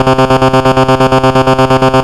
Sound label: Sawtooth Trem C2; Ableton Foundation FreePacks; Foundation Glitch Toys & LoFi